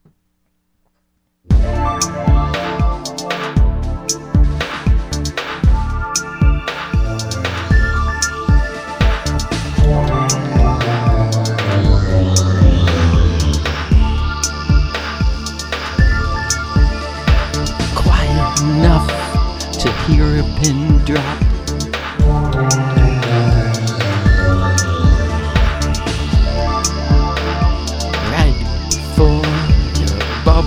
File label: Pin Drop